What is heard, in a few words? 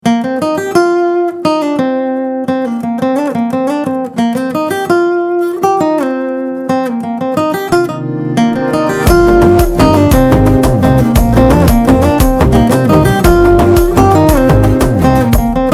BGM Ringtone Mix